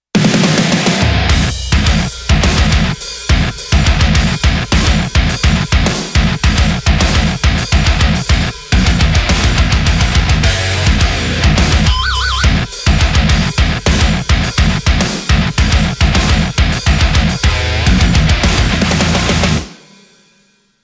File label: chop modern metal, tones